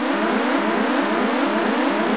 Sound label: WARN